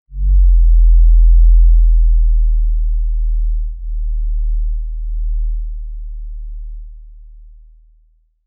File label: rumble, synthetic